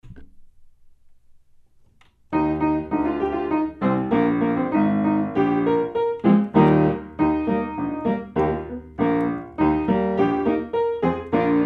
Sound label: piano